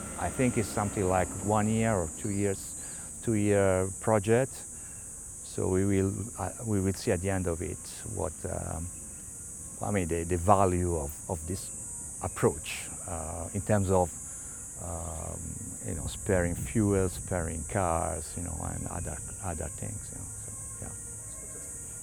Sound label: Insects Untreated